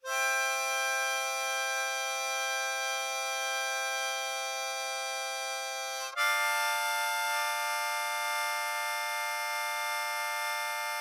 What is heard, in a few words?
Chords Central Octave Blow C Major, Draw Dm, Blues Harp